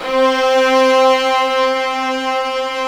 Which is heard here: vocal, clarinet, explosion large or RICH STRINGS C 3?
RICH STRINGS C 3